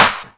Claps & Snares

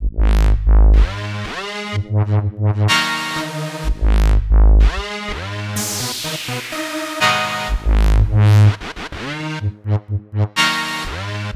Transport G#m Full Mix, Complex Electro Synths; Prime Loops

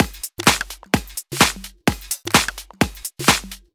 Loop, 128 BPM

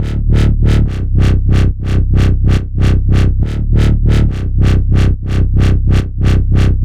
140 C BASSLINE, Dubstep

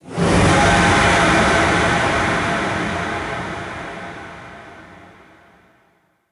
LONG FX; Loopmasters; Keith Mackenzie & Fixx Present Booty Breaks Vol.2

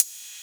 Ride, Dubstep One-Shot Drums

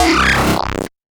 140 FX LOOPS; Loopmasters; The New Sound Of Dubstep

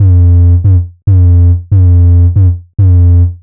140 F BASSLINE, Dubstep; Loopmasters; The New Sound Of Dubstep; BASS LOOPS

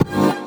D STAB, Funky Underground; Loopmasters; Funky Underground Vol 2

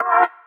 Bb STAB; Loopmasters; Funky Underground Vol 2

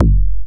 technically a kick; Drum Hits; Kicks; Loopmasters; Jon Carter & Alex Blanco; Block Party Essentials

